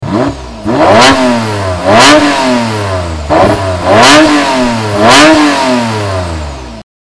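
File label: cars, traffic jams and car traffic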